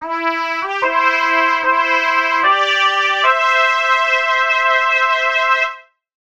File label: 5 2nd movement motive; Beethoven